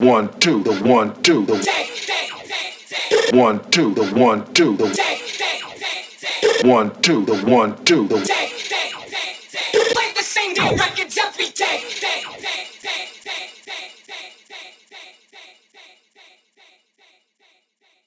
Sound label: Vocal Loop, 145BPM